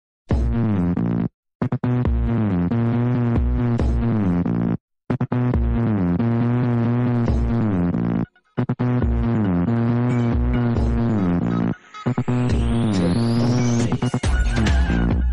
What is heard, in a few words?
bhangra slow remix